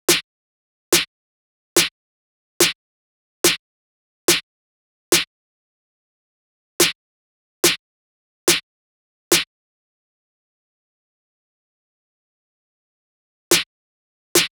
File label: Drum Loop - 143 BPM Snare; Loop Stems; Cymatics; Hyperbass Drum Loop